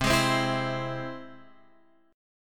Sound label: C Augmented, Guitar Open F, chord; strummed; x,7,8,7,7,7; JGuitar